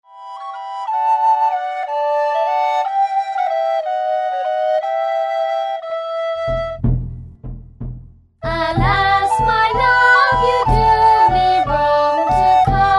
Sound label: Greensleeves (vocal)